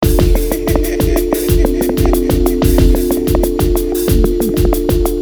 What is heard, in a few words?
Loop